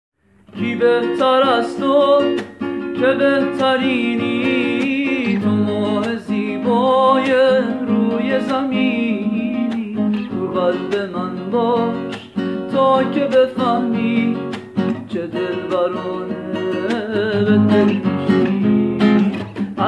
Guitar

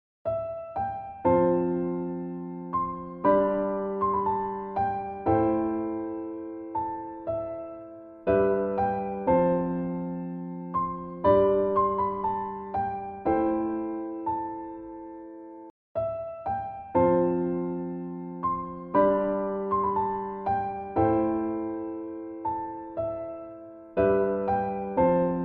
Ringtone; Braveheart

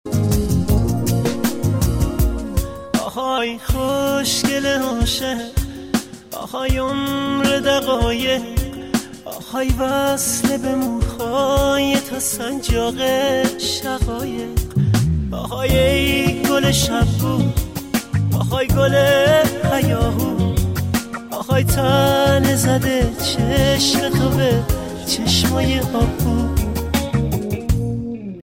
Sad Ringtone; iran

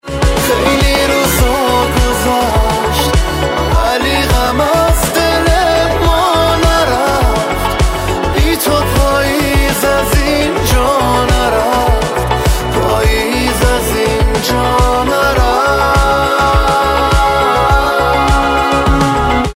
Mobile Ringtone

